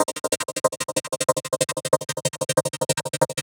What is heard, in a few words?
synth 140 sweetchop C; Transmission-X